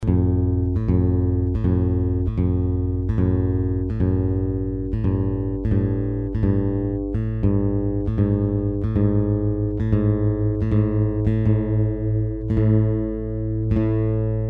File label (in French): accordage